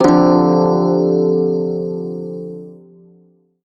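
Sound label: Prime Shawzin Minor Chord, Music; Warframe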